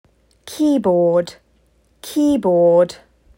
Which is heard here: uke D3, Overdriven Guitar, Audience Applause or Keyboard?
Keyboard